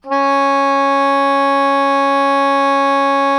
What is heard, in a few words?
SOPRANO C#3, Saxophones; MF; Greytsounds; Philip Wolfe David Crozier's Saxophones